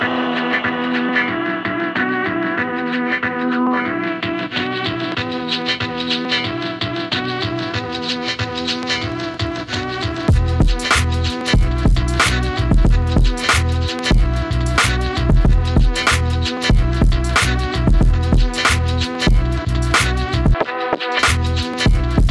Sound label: LOOPS